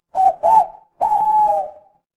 animal owl

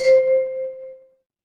instrument chime bar

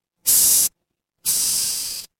mechanical machine

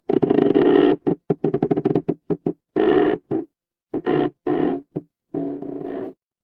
horror creak

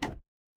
retro game hit

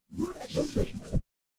ui delete

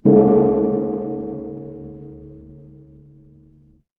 instrument gong

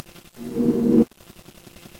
transition riser

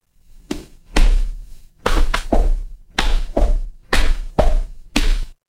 footsteps concrete

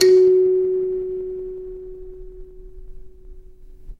instrument kalimba

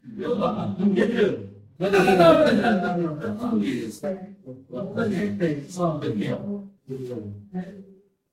crowd gasp

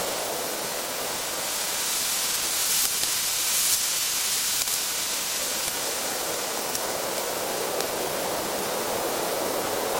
ambience forest

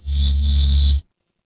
animal insect

